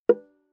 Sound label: Viola pizz ff sul C B4; MIS Pitches - 2014; Strings